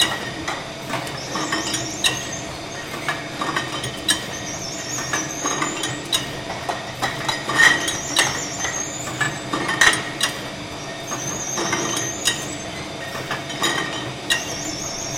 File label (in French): embouteilleuse